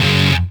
Electric Guitar, Loops; Guitars

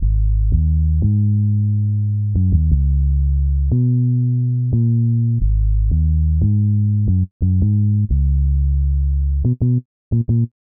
Synth Bass; Basses

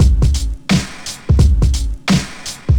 Old School Break, Drums

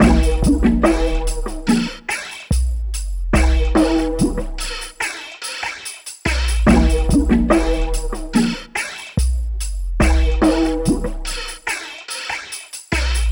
Electronica Drumloop; Drums

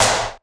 Clap One Shot; Drums

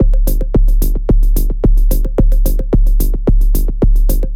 Dance Drumloop; Drums; Dance Beats

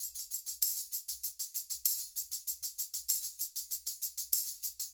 Acoustic Percussion

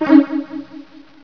Teleport; Bombzuka; Retro

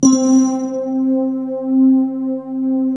RHODES; SoundFactory

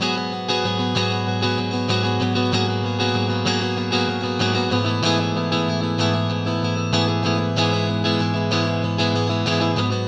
Acoustic Guitar; Guitars

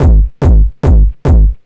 Kick Loop, Drums, Dance Beats

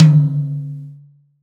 acoustic tom; acoustic drums

